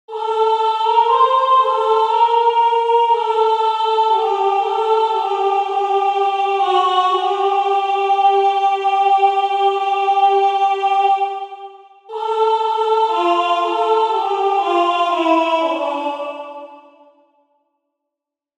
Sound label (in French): je ne menge soprano